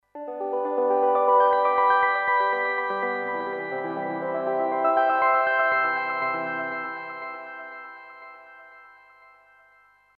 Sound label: ringtone